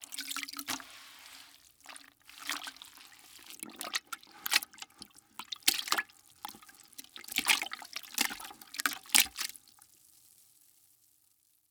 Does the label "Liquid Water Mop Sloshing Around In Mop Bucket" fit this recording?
Yes